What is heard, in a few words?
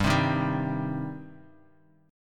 G Suspended 4th Sharp 5th G down Bass Standard; strummed